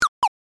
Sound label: Penguin Waddle